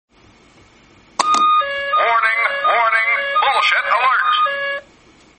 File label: alert